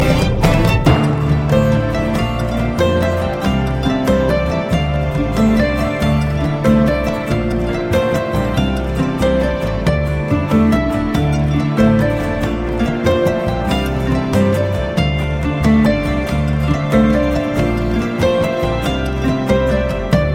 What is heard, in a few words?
Waltz